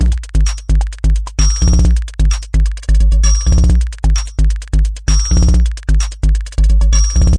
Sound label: analog berlin, drum loops and breaks; soundsonic nks